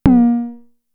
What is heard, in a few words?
Tom, Toms; Fricke Schlagzwerg; Drum Machines